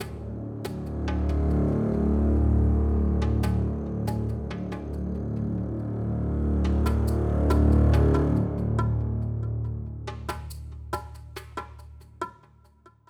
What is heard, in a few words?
Ethnic Textures Basic Loop; Eternal Edge